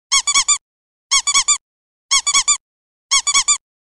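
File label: SMS ringtone, duckling; iRingtone; Utochka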